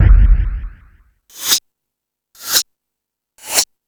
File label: Space Drums, E-MU Pro-Cussion; drum machine